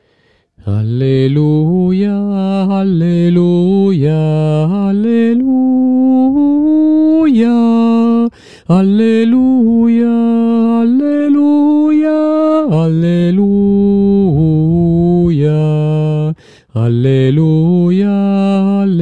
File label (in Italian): soprani; concordia